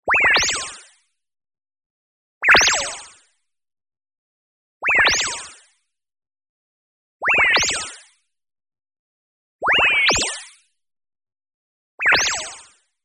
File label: tonal, modulated sine; boom library; Anime Essentials